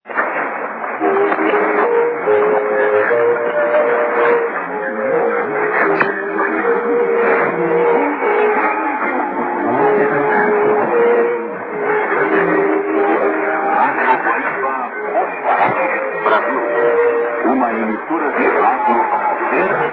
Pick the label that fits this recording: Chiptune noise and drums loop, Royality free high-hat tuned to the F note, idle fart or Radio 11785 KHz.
Radio 11785 KHz